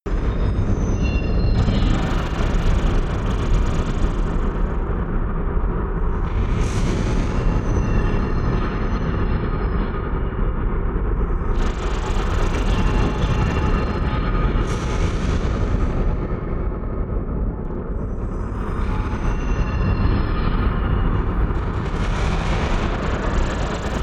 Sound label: purgatory chaos, horror